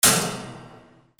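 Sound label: percussion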